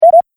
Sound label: Collect Item Pick Up Sound, video game sound effects